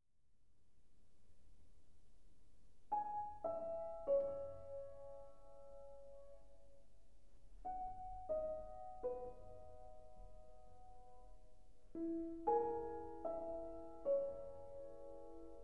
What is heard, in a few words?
bells